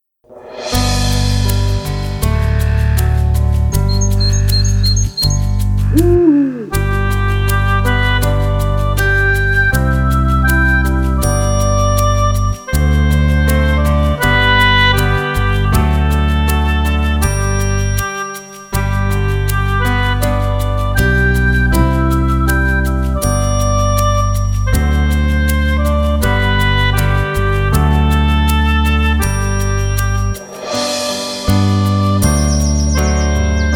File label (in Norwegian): Alle fugler 80 gdur, Gitarkurs